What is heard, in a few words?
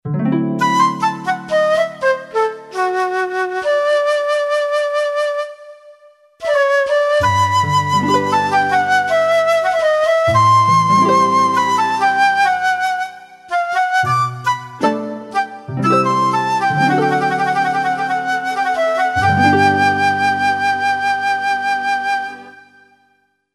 flute